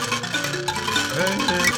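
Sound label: PLK Bass Mbira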